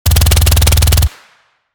Tactical submachine gun short burst

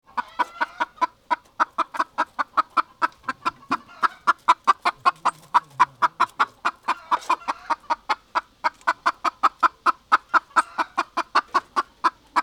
Chicken clucking; animal

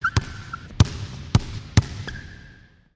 Ball bouncing indoor basketball court

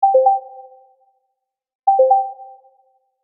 Bluetooth pairing tone headphones connection; headphones and speakers

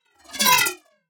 Futuristic whoosh transition

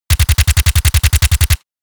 MP5 submachine gun full auto fire; war

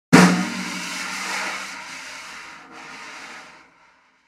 Jazz brush

